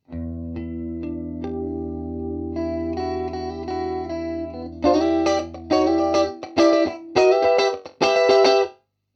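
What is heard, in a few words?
med light chorus, bass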